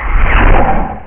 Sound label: menu next